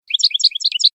floreo bachi bachi, campero

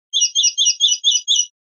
pitadas, pardillo

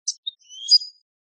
quejas; chipicolio

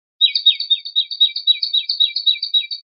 rep primaveras, pardillo

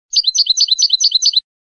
tableteos, campero

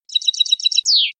terminacion chacheada

